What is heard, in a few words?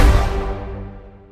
3rd kill sound; valorant